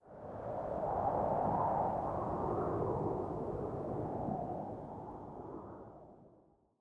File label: wind low; nature; draugnorak